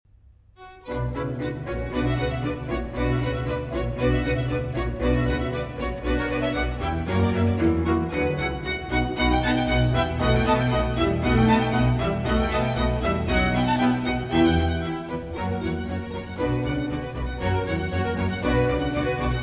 Cello Concerto in C Minor, Alleg; Vivaldi; RV 402; 1. Allegro

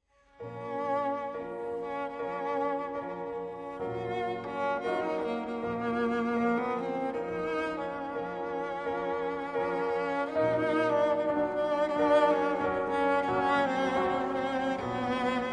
Etude in B Flat Minor, Cello and Piano; 12 Etudes For Piano, Op. 8; Arr. for Cello and Piano by Gregor Piatigo

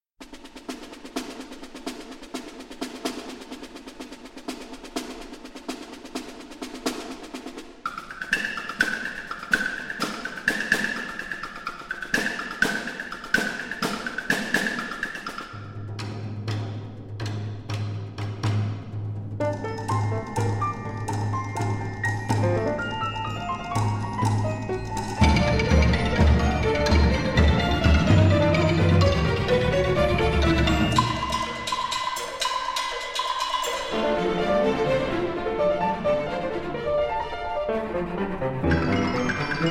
Percussion Concerto, Triangle

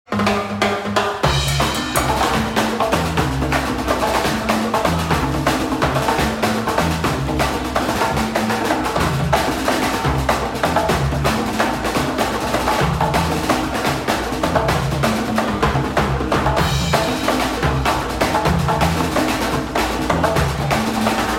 Drum Circle

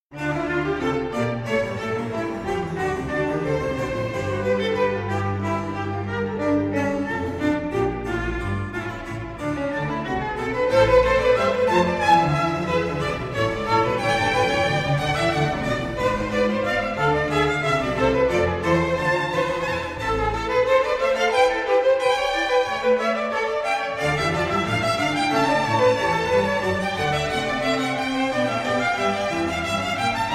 Concerto For Two Violins in D Minor, Vivace; Bach, JS; BWV 1043

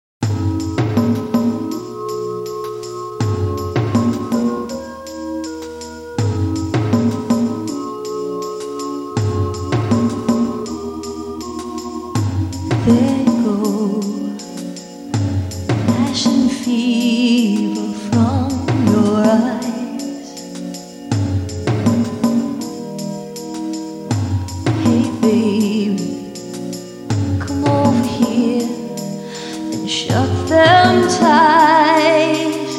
Acoustic

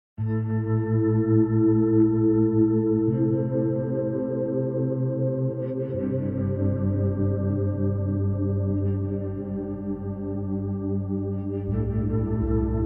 Organgrinder

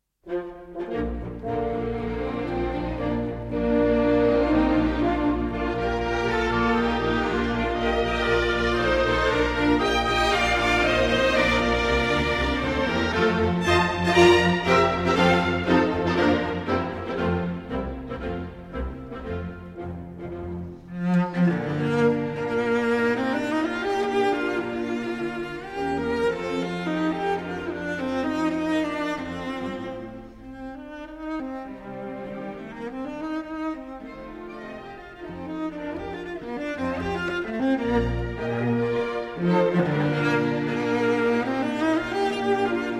Cello Concerto in B-Flat Major, Allegro Moderato; Boccherini; Arr. Grützmacher; G. 482

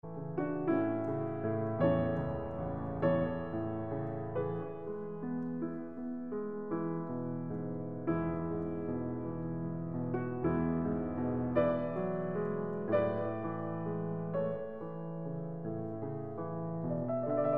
Piano Sonata in D Major, Larghetto a Capriccio

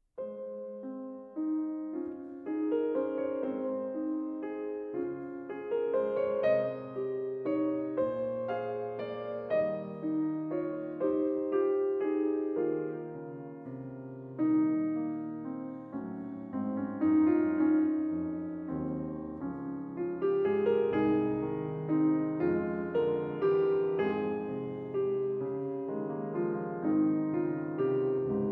Piano Suite in E Major II. Lento Con Grazia

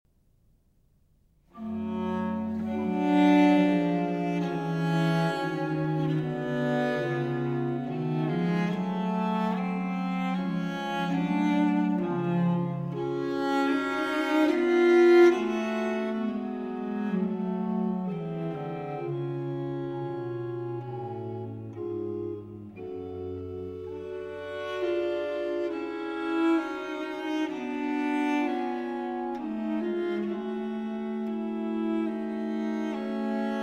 Sonata For Cello and Continuo in B Flat; Vivaldi

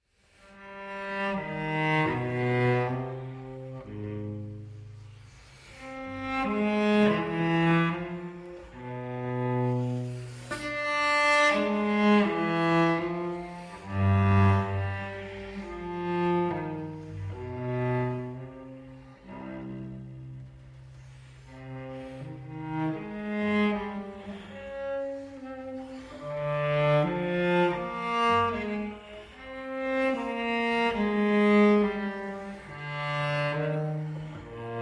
Cello Suite No. 5 in C Minor, Sarabande; Bach, JS; BWV 1011

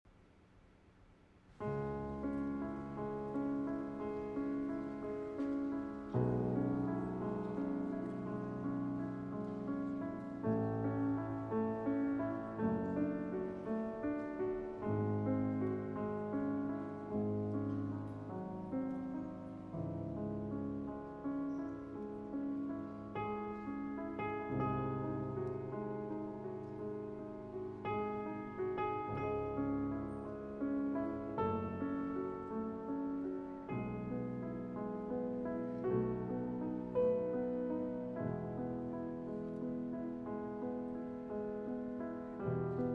Piano Sonata in C Sharp Minor, Adagio Sostenuto; Piano Sonata No. 14; Op. 27 No. 2; Moonlight

